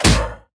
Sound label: minotaur headbutt